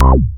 Anasound Short mid C, Industrial Analog Single Notes; Sonic Foundry (Sony Creative Software)